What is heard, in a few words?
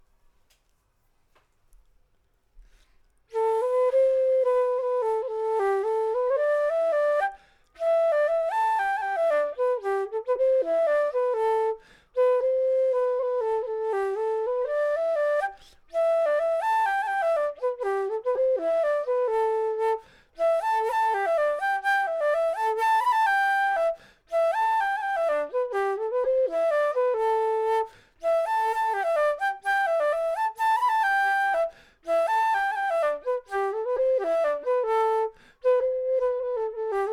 Ballydesmond polka; slow session de Lyon